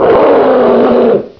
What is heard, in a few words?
sight, shambler; svencoop; quake1